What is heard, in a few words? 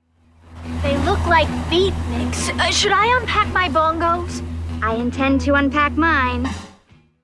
unpack bongos